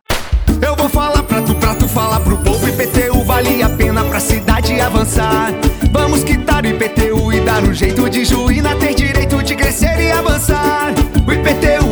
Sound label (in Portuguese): Jingle IPTU; Juina